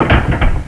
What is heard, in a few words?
lock